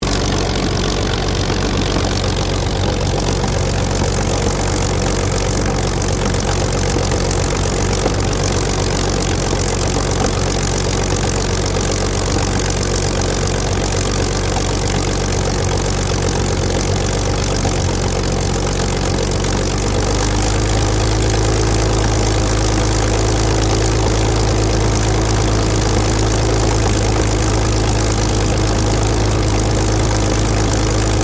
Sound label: AIRCRAFT SUPERMARINE SPITFIRE MK-VIII IDLE STEREO; Airplanes